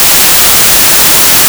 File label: noise; ocaml